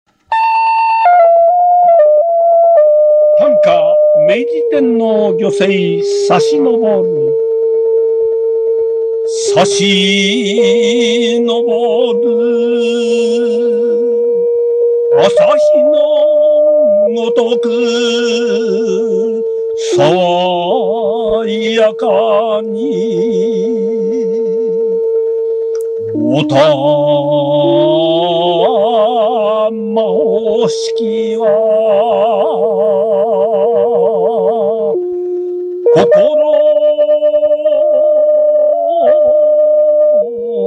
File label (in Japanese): tanka; sashinoboru